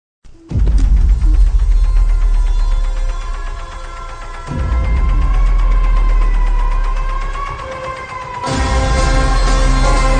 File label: Gun Scene BGM Ringtone; KGF 2